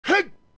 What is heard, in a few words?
Attack; Dark Link; zelda; OOT